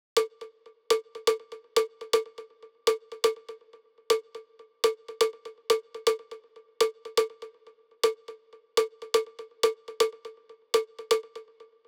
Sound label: perc tribal drop loop cowbell 122 A minor